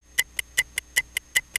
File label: clock